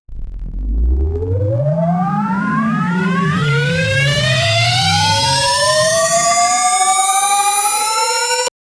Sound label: Sci Fi Electronic Riser